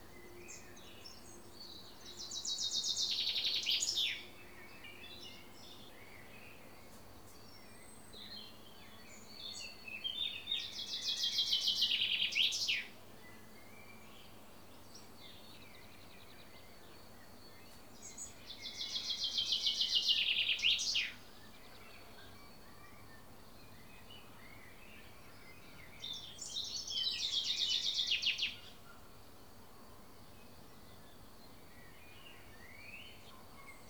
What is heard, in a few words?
birds unknown sound